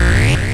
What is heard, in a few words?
Transform; Vaati transforming into Vaati Reborn (3 sounds - #2 loops 5 times)